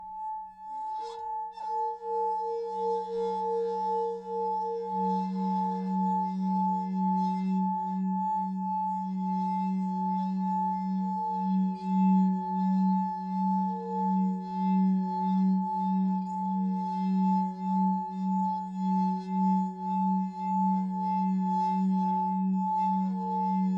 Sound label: Eerie Ambience Wine Glasses